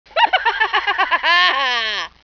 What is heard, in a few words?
attack; svencoop; decay